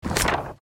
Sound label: page flip